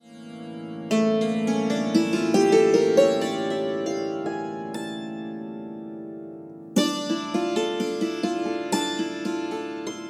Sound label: instruments with voices; entrance